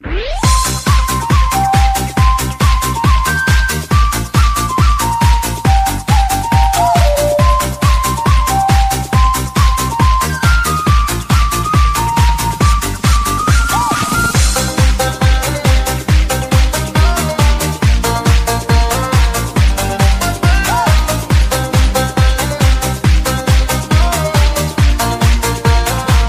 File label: Flute Remix